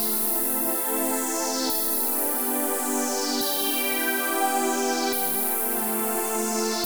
TECHNO PAD 140; Keys

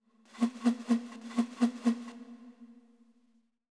ETHNO 125, FX

